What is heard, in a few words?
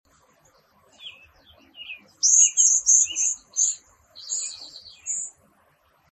cedar waxwing